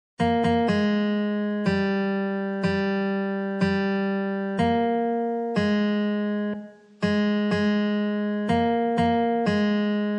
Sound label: Guitar